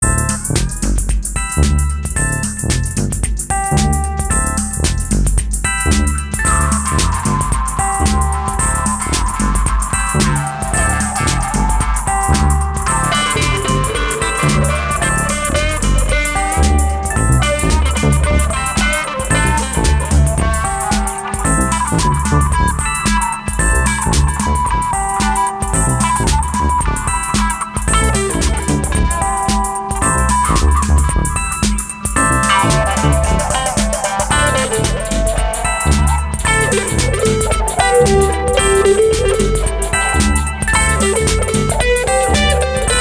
Inequality Groove

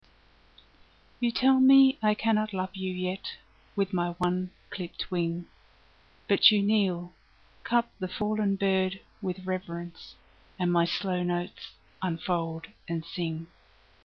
Slow Notes

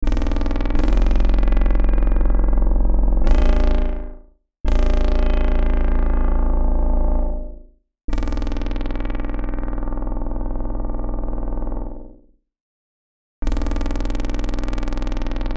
Sub Motor

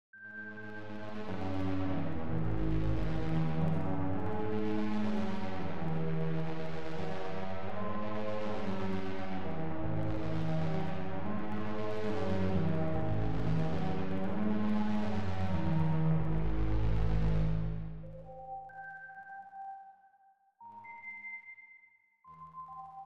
drums Inst; Volunteer Fire Brigage

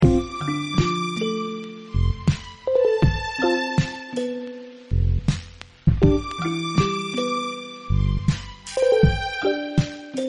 Rootloop

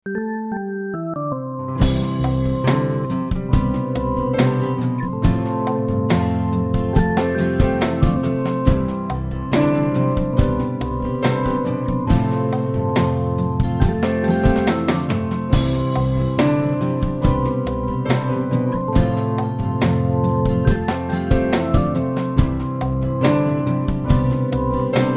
vocals and stems

